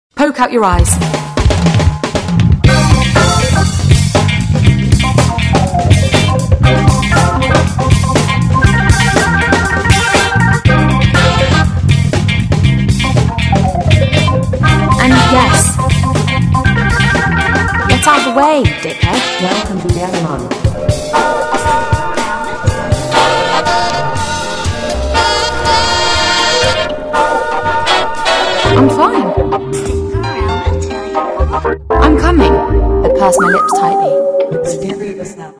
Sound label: funk